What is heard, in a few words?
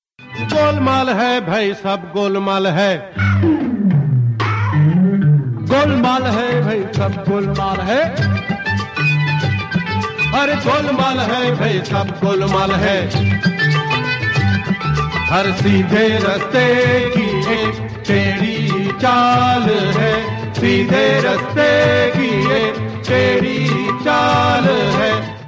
old song, ringtone